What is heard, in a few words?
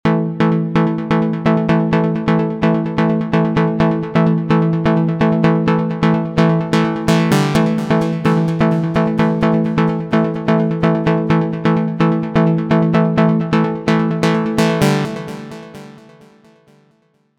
one note melody